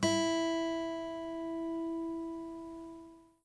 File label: guitar 1st E